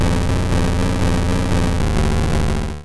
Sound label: Blizzard; Pokemon; Gen 1; attack moves; RBY